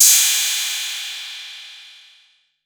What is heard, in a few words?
808, CYMBAL; CYMBAL P2